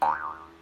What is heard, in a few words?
boing